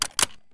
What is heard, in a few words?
fal mag in; Escape from Tarkov